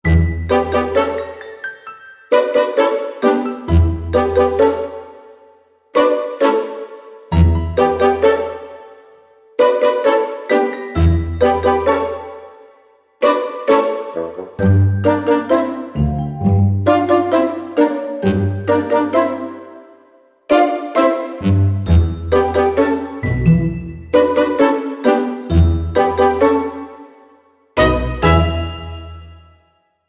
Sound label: No Percussion No Drums